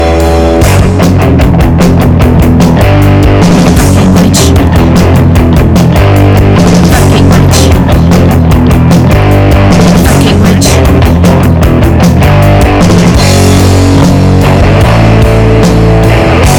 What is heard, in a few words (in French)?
sonnerie refrain